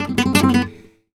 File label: gta e, Flamenco, Guitar, Picado